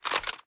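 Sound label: Equip Movement; Valorant; judge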